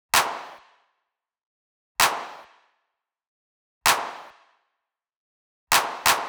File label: Drum Loop Clap 129BPM